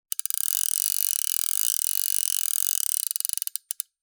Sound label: Fishing rod reel slow